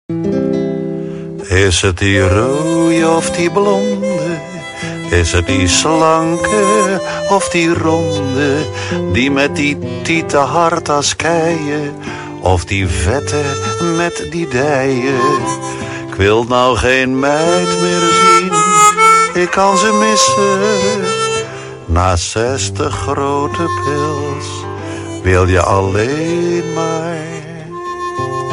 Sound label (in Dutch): Drankorgel